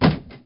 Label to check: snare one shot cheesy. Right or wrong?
Wrong